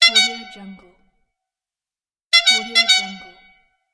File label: old car horn